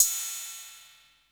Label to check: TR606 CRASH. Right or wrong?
Right